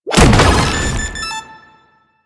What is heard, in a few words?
Proto Infested Brachiolyst Teleport Stop; Warframe; Abilities